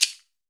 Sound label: F-BEADS; AKAI S-Series CD-ROM Sound Library VOL-5